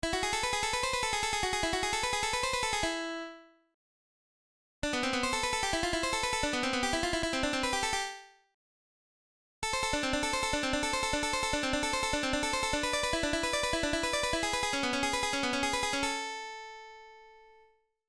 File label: legato